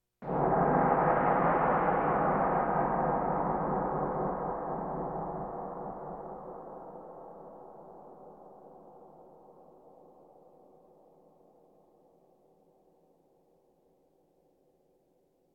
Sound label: Percussion Bongos Tympani rolls Gongs Drums